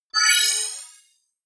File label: appear sparkle positive short